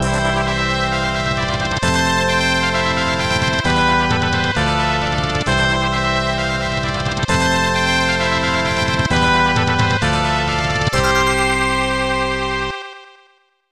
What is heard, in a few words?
Stage Clear Bonus; Knights of the Round; SNES